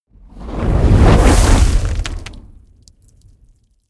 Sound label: whoosh fire sizzle medium